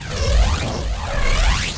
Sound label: laser charge; weapons; galak